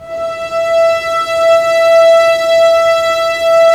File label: STR SEC, Roland LA Composer, Sec Mute St